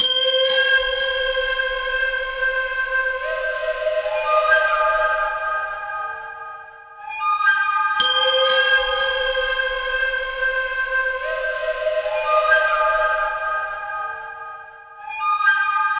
Strange Distant Flute, Ethnic Instruments; Sonic Foundry (Sony Creative Software); The Electronic Music Manuscript Collection

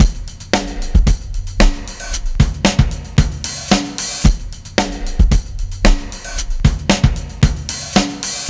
Old McDonald 113 bpm, breaks; Zero-G Beneath the Planet of the Breaks

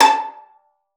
A5, VIOLIN PIZZ; Xsample VOL-5; 90 s Sample CDs

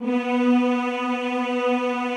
B2, Classical Choir; Best Service; Extended Classical Choir

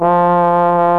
BASIC ENSMBL; OMI - Universe of Sounds

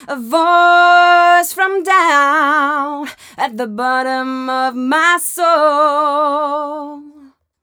f mel d, fem Vocals

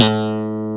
hard slap, bass; East West; Global Sounds

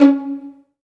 STR PIZZ, STR Pizzicato; Sound & Vision; Gigapack I CD 2; Orchestral

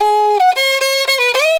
riti grooves; Best Service; World Colours

